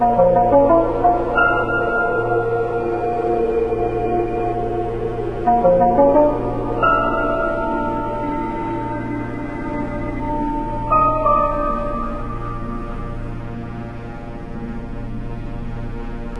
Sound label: MUSIC; MioMap C220